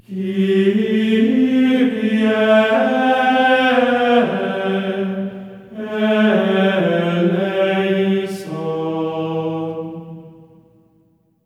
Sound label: KYRIE A4, voices; Spectrasonics; Symphony of Voices; GR KYRIE